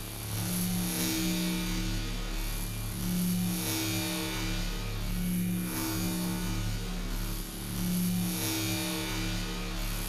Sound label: cloak loop; Titanfall 2